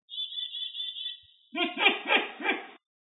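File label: Unknown Voc, Great Horned Owl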